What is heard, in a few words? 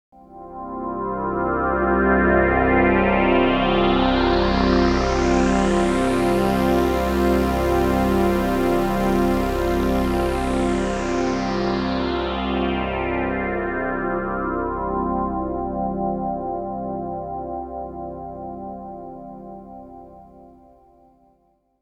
Synthesizer Sweep